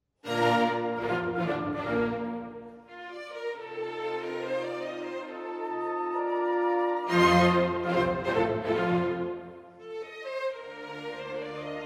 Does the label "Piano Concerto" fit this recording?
Yes